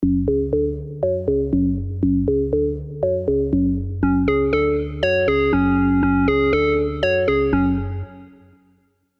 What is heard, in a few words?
Korg Organ; spire old skool